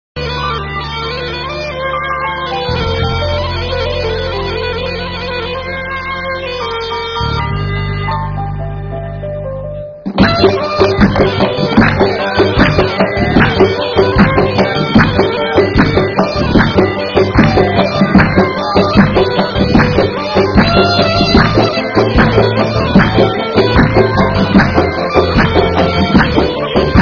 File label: music